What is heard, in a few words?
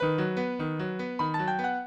minuet, mozart